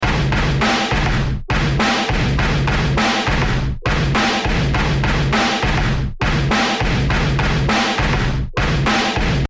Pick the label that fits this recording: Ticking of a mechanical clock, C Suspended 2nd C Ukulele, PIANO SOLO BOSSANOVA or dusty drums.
dusty drums